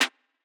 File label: snare